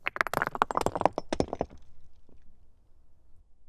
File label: stone crumbling; super snuvi